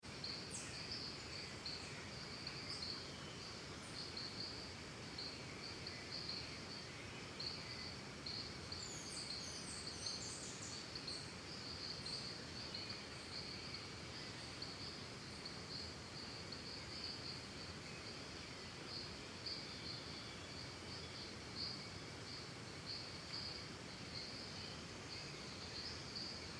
Birds Crickets Costa Rica Calm, forests and jungle; Backgrounds; BigRoomSound